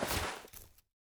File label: snowball throw; player; winter